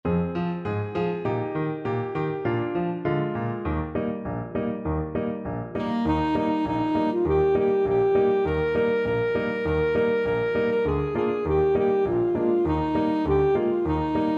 ASAX, saxophone

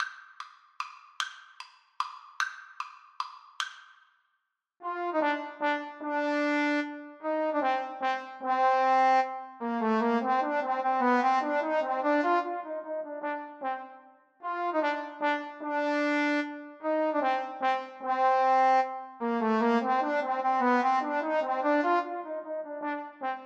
Trombone Duet

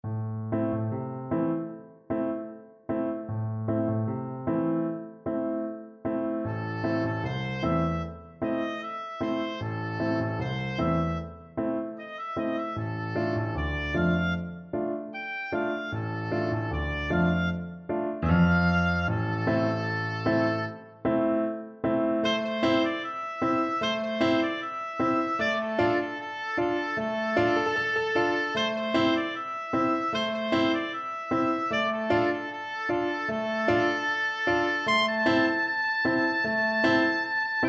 reggae, oboe

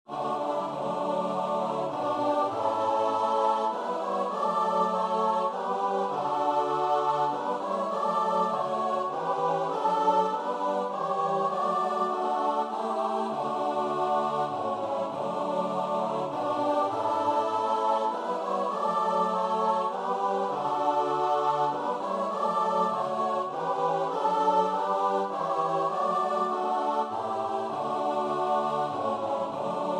choir

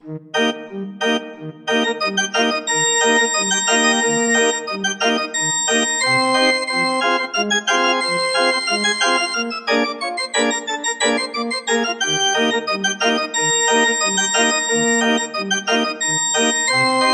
organ

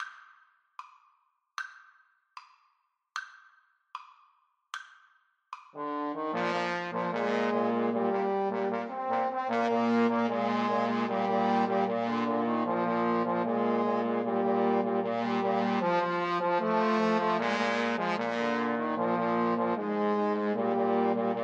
trombone trio; nazareth comigo

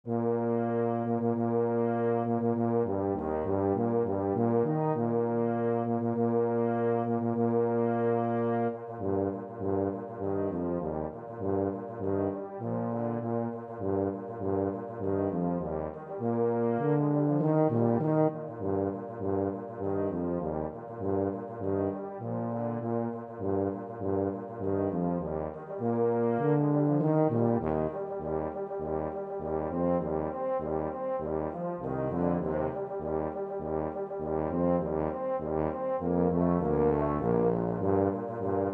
tuba duet

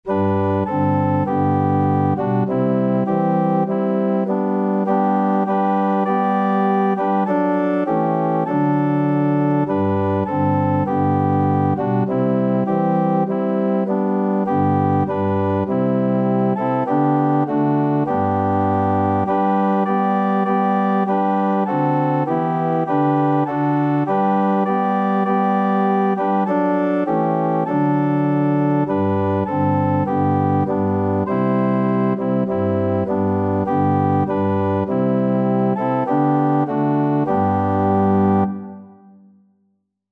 tis so sweet, organ

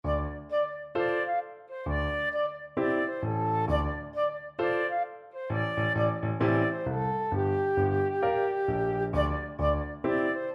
hip hop house, flute

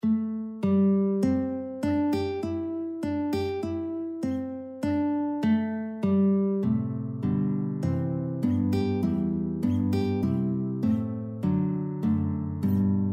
guitar trio

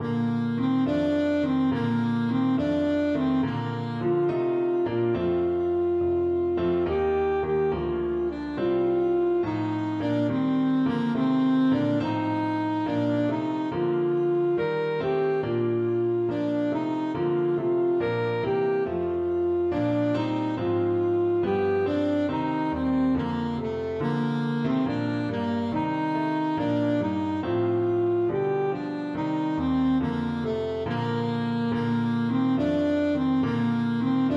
wassailing, saxophone

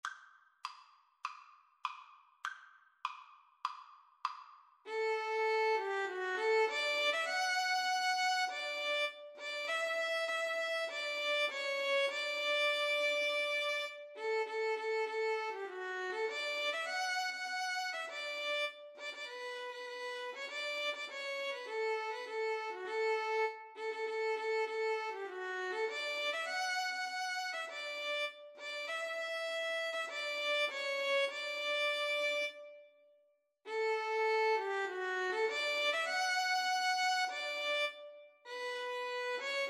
battle hymn, violin cello; Violin-Cello Duet

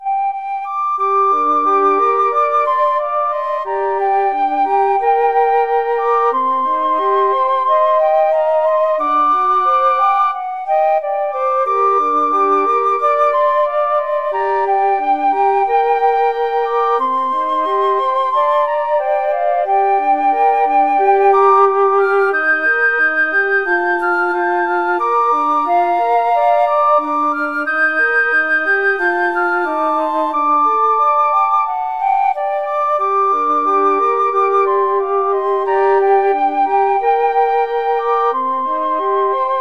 wayfaring stranger fl trio

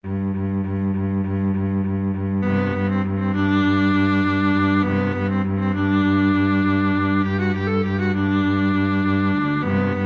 viola cello duet, high; parkgate

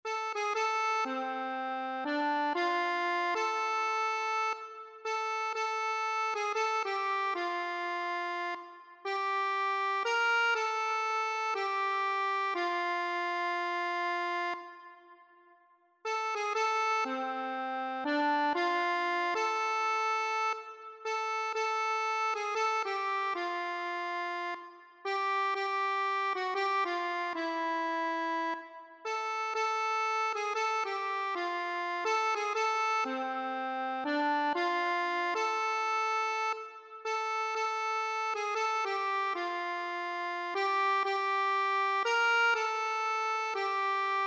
down by the riverside, accordion

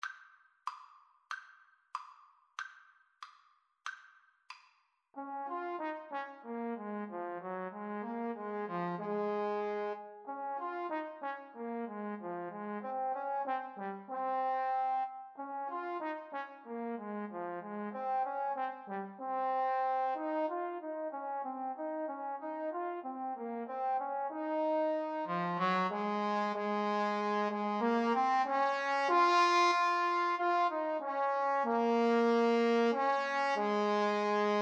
Trombone Duet

